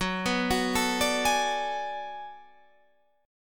B Minor 13th F sharp

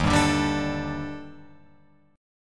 Eb Major Sharp 11th Eb Guitar Open F; EbM#11; strummed

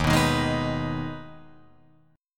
Eb Suspended 4th Sharp 5th Eb Guitar Open F; Ebsus4#5; strummed

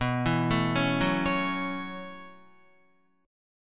B 9th Suspended 4th down Guitar 4F,4A,5C,5G,5C,6E; B9sus4; arpeggiated; JGuitar

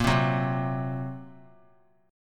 Bb Suspended 2nd Sharp 5th; Bbsus2#5; strummed; JGuitar

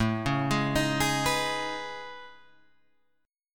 A Major Sharp 11th, Guitar, Open G

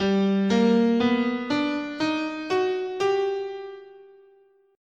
G Augmented, scale

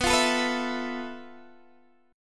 F Major 7th Flat 5th B, guitar chord

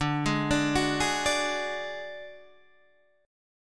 D Major Flat 5th D, chord

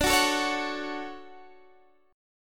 D-Major 7th D down Guitar Drop D

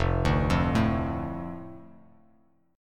F 6th F Bass Standard; F6 arpeggiated

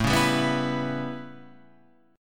A 9th, down

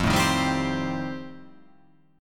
F 9th F, chord

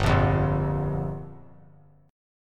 G Minor 7th; Gm7 strummed; JGuitar

